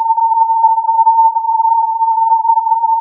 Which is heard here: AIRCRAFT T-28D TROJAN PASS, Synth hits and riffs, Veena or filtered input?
filtered input